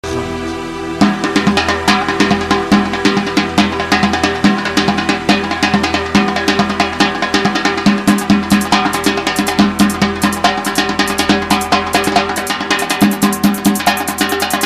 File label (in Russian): kavkazkie barabany